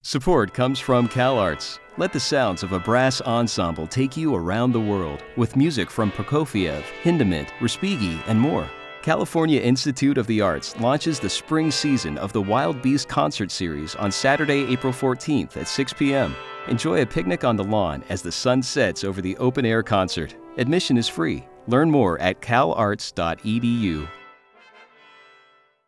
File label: brass; calarts